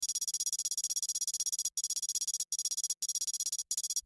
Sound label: Computer beep